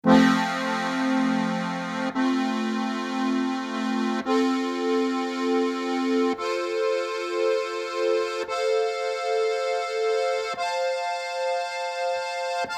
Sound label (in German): GCFB Akkord, harmonika